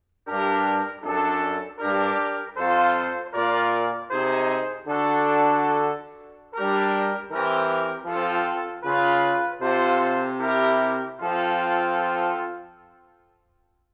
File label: Harmonic Dictation